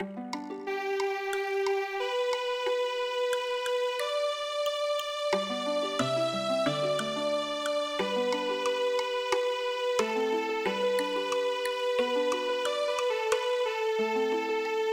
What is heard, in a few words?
seido arirang Healing Harp